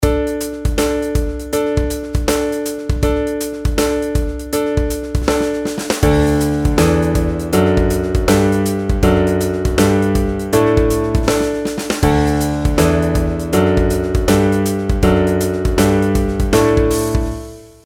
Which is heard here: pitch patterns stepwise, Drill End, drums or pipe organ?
pitch patterns stepwise